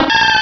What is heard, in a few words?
cry; source of the sovereign